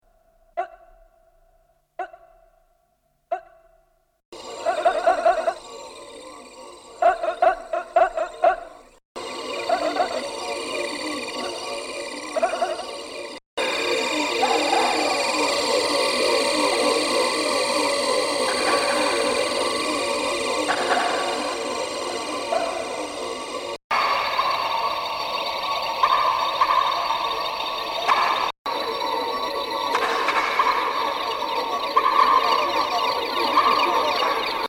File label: shout